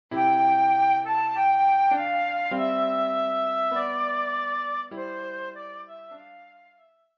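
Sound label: flute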